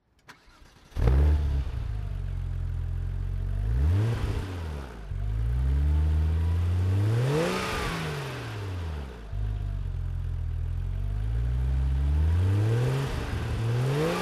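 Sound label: porsche 911 993 turbo throttle, engine sound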